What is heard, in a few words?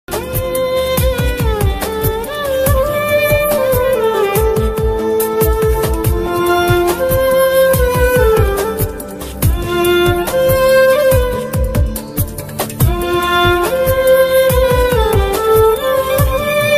love bgm ringtone south indian bgm ringtone; Style movie